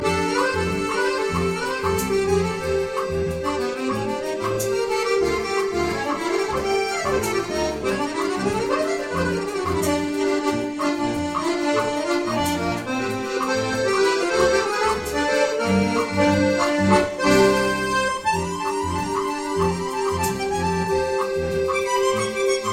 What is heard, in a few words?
charming melody, accordion; rossstall kurz